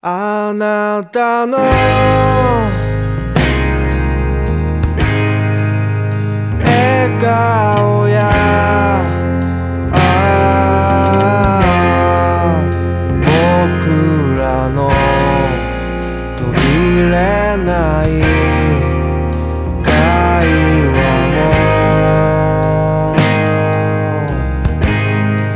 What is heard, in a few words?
piano